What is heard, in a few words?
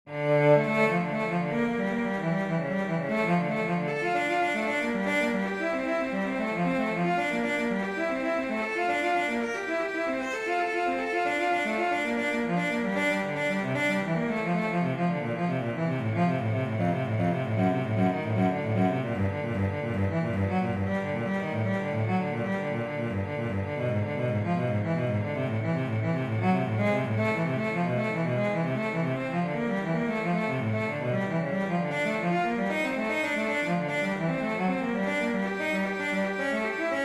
praludium minor for cello; Johann Sebastian Bach; bwv 851